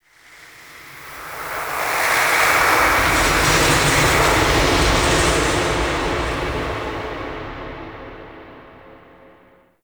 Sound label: Champion Hub Texture, Ambience Dungeons Under City Champion Crypt Hub